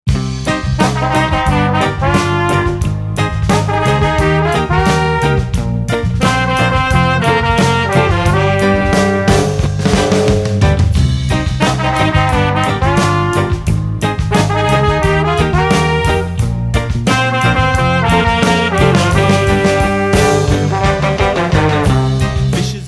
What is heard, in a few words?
jazzy